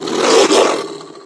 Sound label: sniff found, antlion guard near; hl2